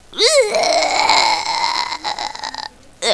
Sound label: UAAAAAAAAAAAAAAAAAAAAAAA